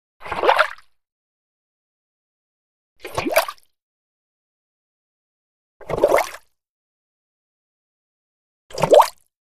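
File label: Bubbles Large Single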